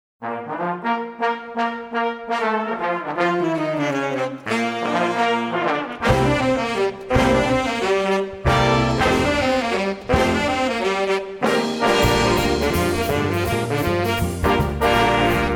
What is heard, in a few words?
swing